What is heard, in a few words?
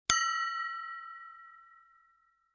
glass clink; drinks